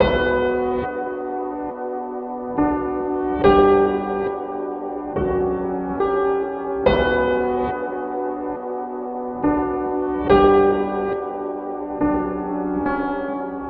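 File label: 140 piano B minor; prowler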